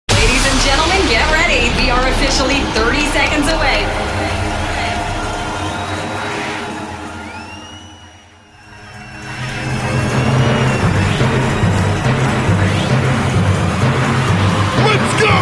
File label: NYE Countdown